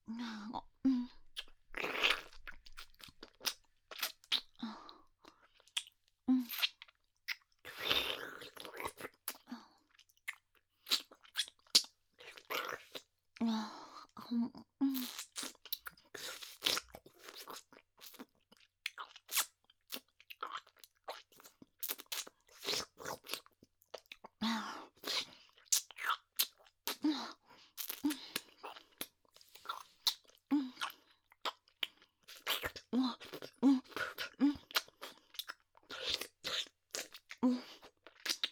フェラチオ; SEなし

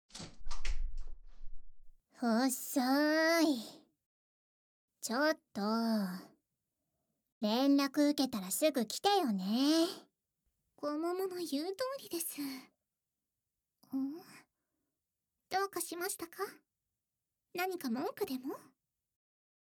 耳舐め; 二人と♪; &おきもち代弁チンポ遊び; SEあり

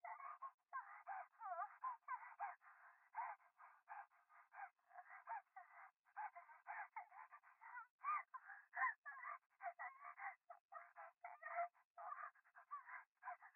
電話 可愛い喘ぎ 山奥夜, ASMR

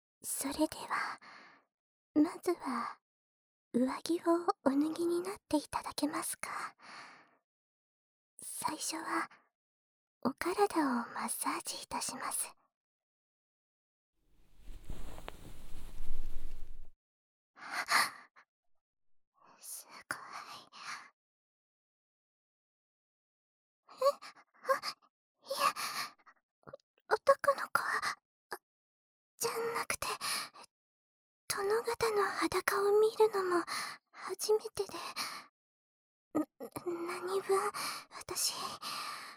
『ローション・ボディマッサージ』, ASMR

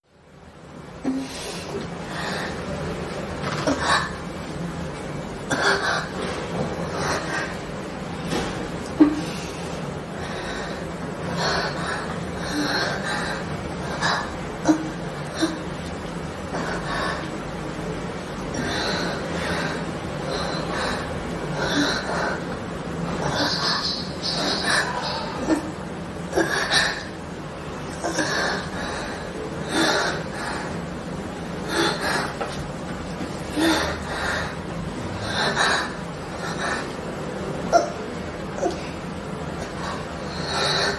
エッチな喘ぎ声を聞かれ興奮しておしっこ漏らす変態女子大生のガチのオナニーボイス

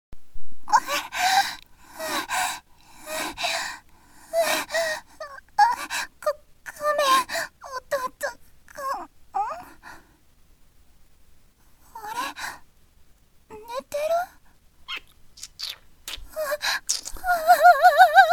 添い寝手マン

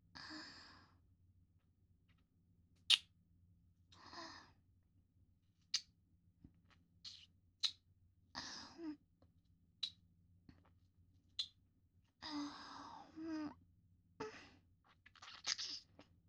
キス; SEあり

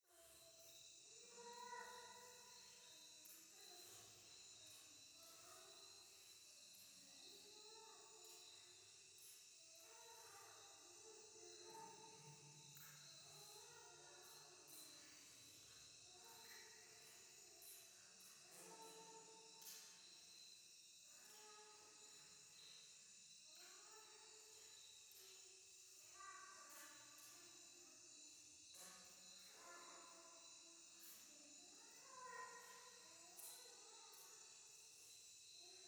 夢の中 イラマチオ 山奥夜

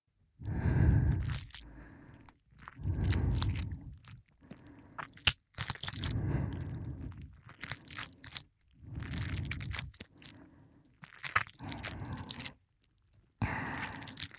耳ローションループトラック

